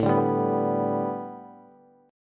A 7th Suspended 2nd Suspended 4th Bass; A7sus2sus4 strummed

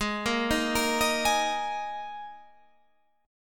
Ab Suspended 2nd Ab down Guitar Standard; arpeggiated; JGuitar

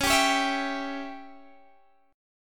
Db Diminished Db down Guitar DADGAD

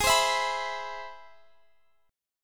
C Augmented Major 7th Ab

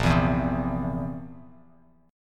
Bb Suspended 2nd Flat 5th Bb down Bass Standard; strummed

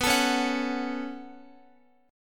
B 7th Suspended 2nd B, Guitar; B7sus2; strummed; x,x,11,14,13,14; 4F,4A,5C,5G,5C,6E

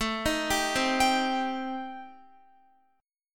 A-Minor 7th Flat 5th, Guitar, chord; Am7b5; arpeggiated; x,12,15,12,12,15

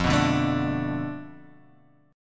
F 6th Flat 5th F down, chord; F6b5 strummed

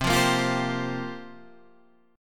C 7th Suspended 4th, Guitar, Half Step Down

